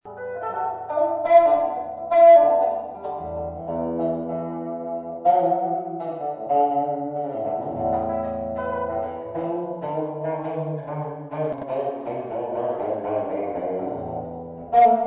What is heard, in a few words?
Tuning